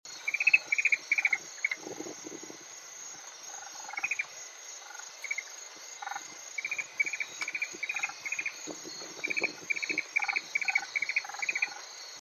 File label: Eastern Grass Owl Tyto longimembris, australasian grass owl call